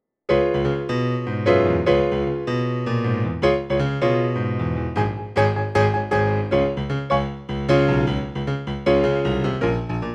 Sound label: accompaniment